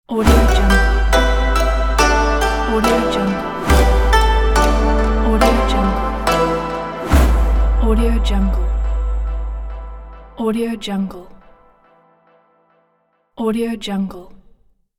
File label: china logo